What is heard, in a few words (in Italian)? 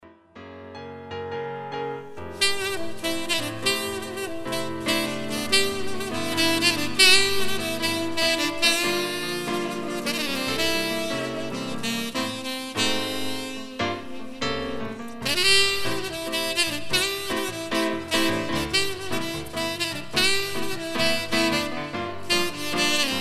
sax